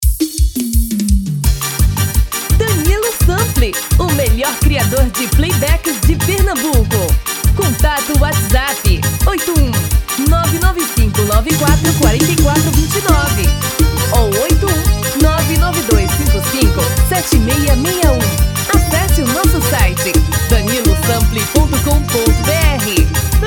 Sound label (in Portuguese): FREVO tom feminino